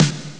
the snare drum; ST-XX Amiga Soundtracker Sample Packs

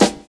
SD, Drums Dance & Groove

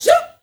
FEM GRP, FEM NOISE; Spectrasonics; Heart of Africa

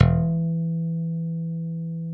E2 HARM, STIK BASS; D-STIK BASS2

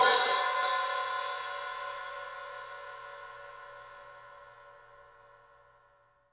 Studio Hats, 3DPercussives

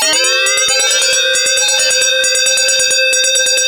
Kaossilator gated (130BPM); Korg Kaossilator; creative gate; musicradar

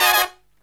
HI CHORD, Brass

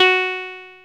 ACID F#4, Dance; Best Service Alpha Dance vol.2

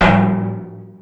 Big Trash Can, Yamaha DD-35

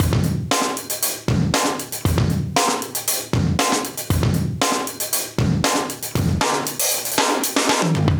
117 Groove, breaks

